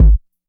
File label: KICK